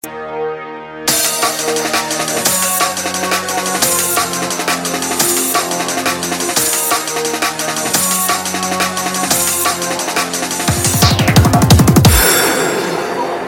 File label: dub